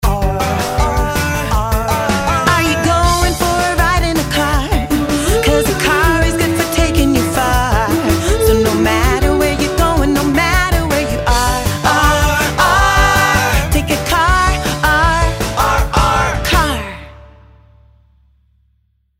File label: Ar Song Car; kindergarten grade 1 2 letter sound